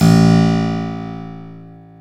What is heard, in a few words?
KEY HRPCR; Roland - LA Composer vol. 1